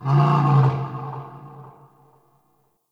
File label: Forest Distant Creature Medium Cry, Ambience; Warframe; Grineer